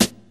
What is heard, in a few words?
raw snare drum g key; snare drum samples